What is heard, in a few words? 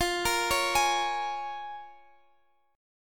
Bb Minor 7th F